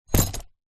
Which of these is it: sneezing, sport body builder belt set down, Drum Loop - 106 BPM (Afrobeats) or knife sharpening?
sport body builder belt set down